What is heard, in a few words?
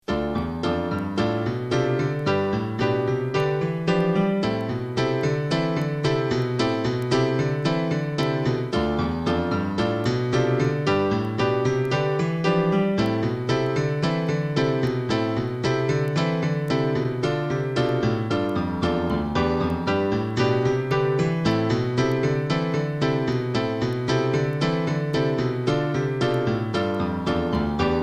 Unknown piano